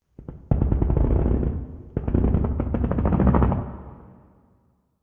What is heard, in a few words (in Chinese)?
诡异森林嘎吱声; ambient